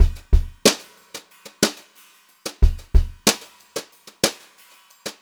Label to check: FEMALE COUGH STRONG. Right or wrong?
Wrong